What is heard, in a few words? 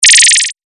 lazer